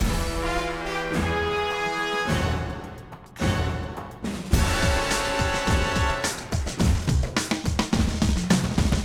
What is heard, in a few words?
Action START; bolt 2008 pc gamerip